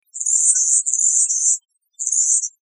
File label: song